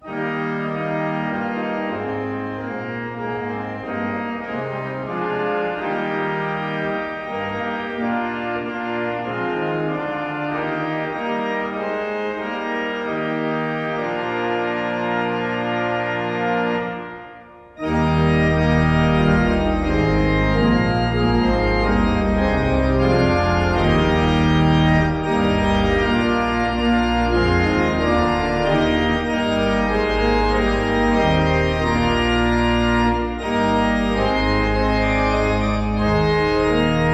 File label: Hymn organ; Be thou my vision; Slane